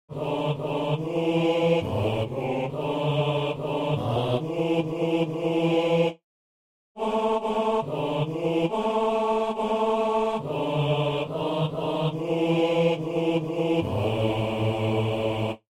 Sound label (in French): basse; Maintenant, Seigneur